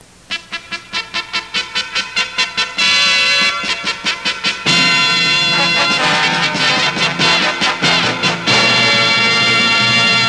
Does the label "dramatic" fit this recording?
Yes